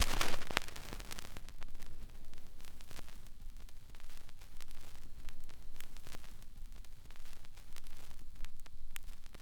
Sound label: needle drop, Vinyl; Misc Percussion